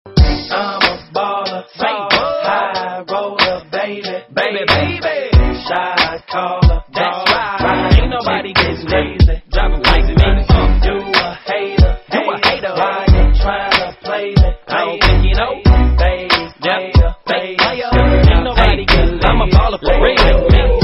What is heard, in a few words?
rap, hip hop ringtone